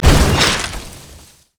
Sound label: bushwhack set; nidalee base